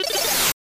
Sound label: Thunder